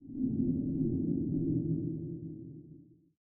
Ambient; Barotrauma; Subsurface

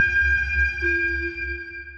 sonar Tail Water Far Shuttle; Barotrauma; Real Sonar

